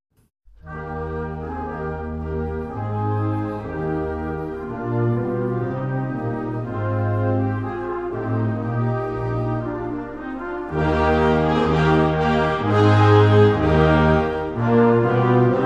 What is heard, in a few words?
St Anthony Chorale, wind band